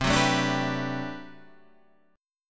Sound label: D 13th C